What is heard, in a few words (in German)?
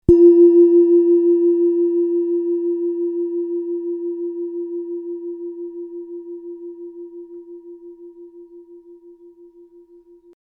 klangschale platonisches jahr 335g